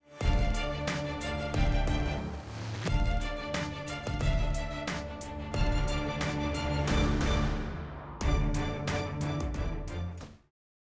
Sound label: Music; Race Sneak Inspire; Race Movie